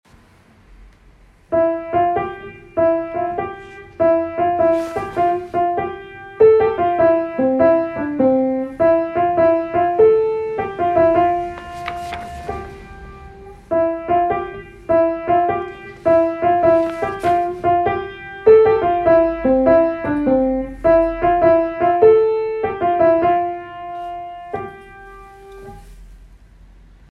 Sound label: Piano; Hoc Chorus